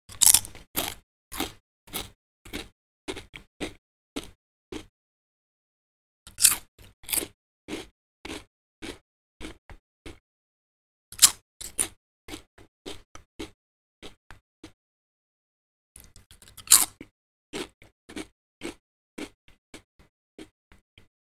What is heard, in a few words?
Chip Chewing Sounds